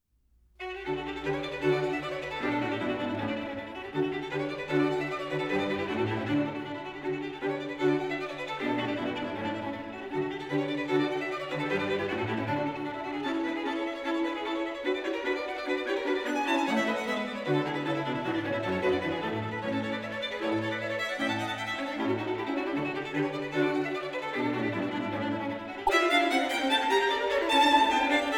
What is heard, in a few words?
String Quartet in D Major Finale Vivace; Lark